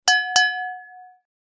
Glass, Ringtones; Samsung; SGH-F310 Serenata

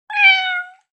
Cat, notifications; MyPhone Agua Rio

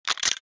Cam Shutter; LG GM730 Eigen

